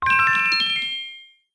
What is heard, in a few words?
Get Rupee Red; Pick up a red rupee (Recorded)